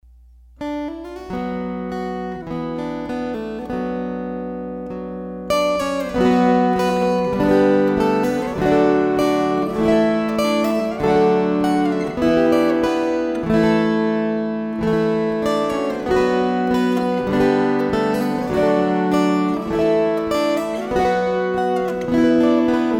String Choir

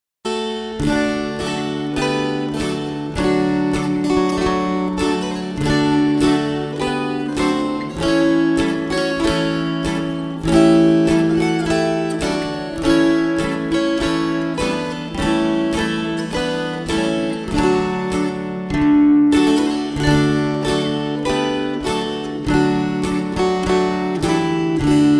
Chinese Breakdown slow

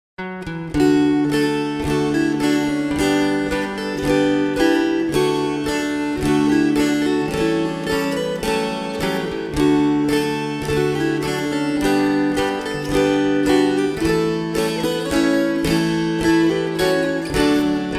slow; Mud Fence

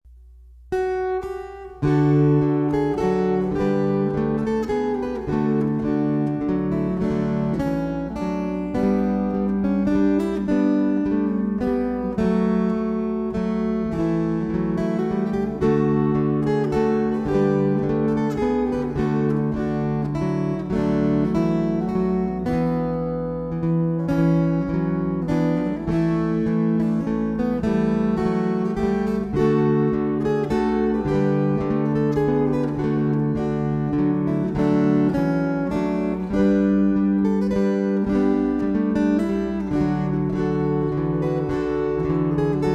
Waltzes

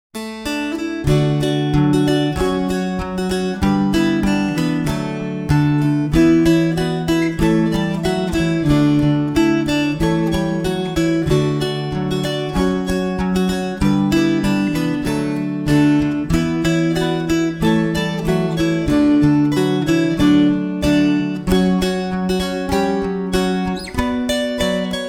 Rag fast

